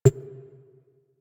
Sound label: UI Common Countdown; Warframe